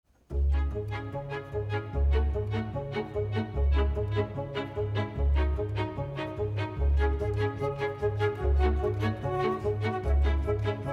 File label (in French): orchestre; petit prince; businessman